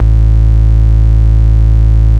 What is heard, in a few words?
bass attack, techno trance